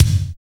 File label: wet kick; Drums In Your FACE!; NEWJACK